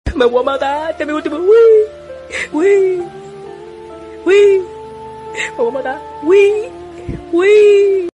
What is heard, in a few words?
flute bansuri bgm